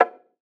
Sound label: Percussion, Trap Drums; One Shots; GhostHack; Drum Mega Bundle Demo; Achieve